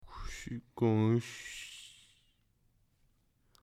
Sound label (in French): ouverture porte de l'exterieur